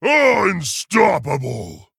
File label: Male Tauren Attack